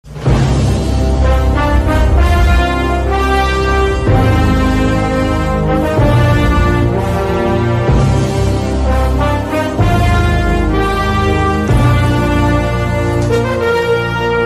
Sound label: interval bgm; Khaleja